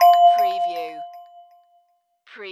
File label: Multimedia Chime